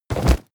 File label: drop backpack leather